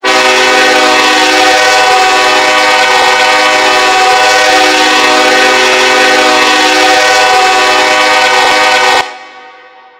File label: k5lla, horn; run 8 v3 horn pack